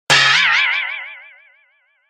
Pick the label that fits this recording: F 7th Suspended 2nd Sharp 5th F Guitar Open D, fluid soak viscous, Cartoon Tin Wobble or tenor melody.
Cartoon Tin Wobble